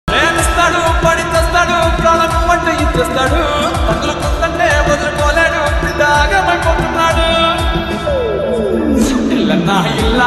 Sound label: lofi telugu ringtone